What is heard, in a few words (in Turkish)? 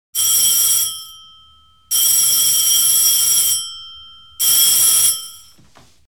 Acayip telefon